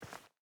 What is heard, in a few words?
footstep hide dirt walk; Rust